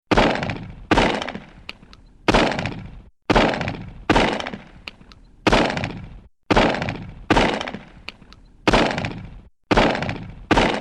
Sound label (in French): coups de feu